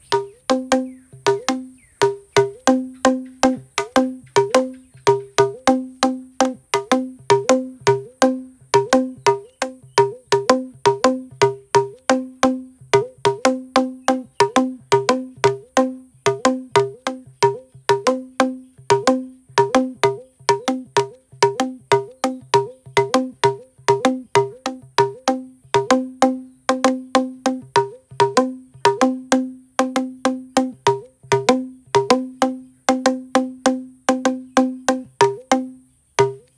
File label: Dhurtang